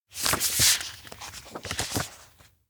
album open page turn; anemoi

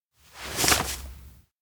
note raise; rikka; anemoi

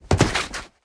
hand jamp; anemoi

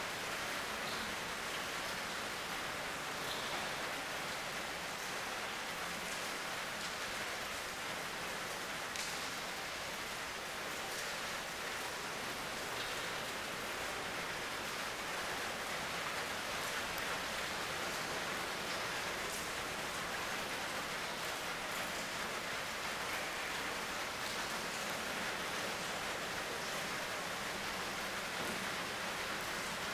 indoor hut heavyrain; LOOP; anemoi

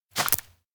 branch step; anemoi

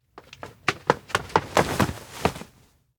steps indoor wind corridor run up hurry; anemoi パッケージ版 1.1

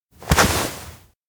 grab arm; anemoi

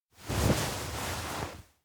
futon hug; awayuki; anemoi

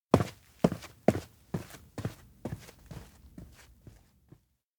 steps outdoor town leave teacher; anemoi

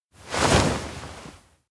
turn multi; anemoi

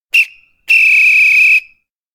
whistle peepy; anemoi

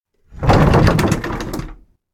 door janitor room slide open; anemoi

